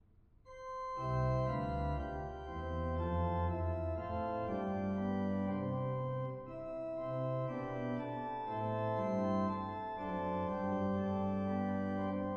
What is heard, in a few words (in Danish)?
forspil; Bøj o Helligånd os alle